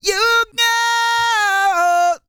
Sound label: REGGAE, Vocal Planet World Voices